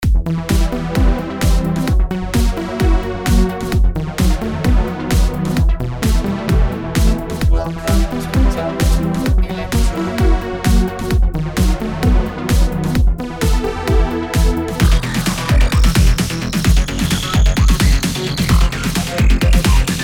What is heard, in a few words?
Robot Electronics